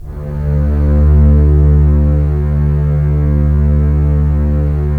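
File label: STR CBS, Strings; Roland - LA Composer vol. 4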